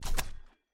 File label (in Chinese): 编队 拿起球员卡牌; nba